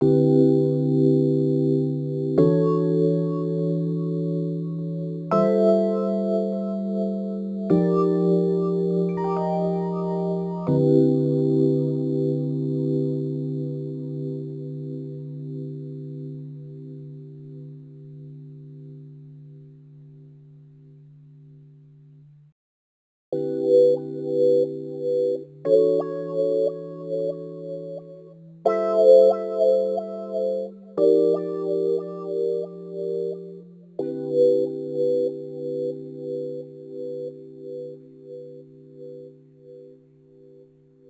Rhodes Attacks; Ueberschall

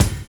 SERIAL BD; Univers Sons

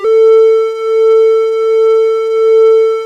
WIND SYN A3

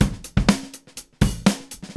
123 groove; AMG Gota Yashiki Groove Activator

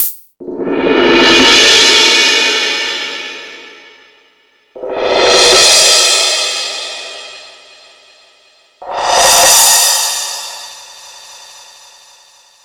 More Cymbals, Drum Machines

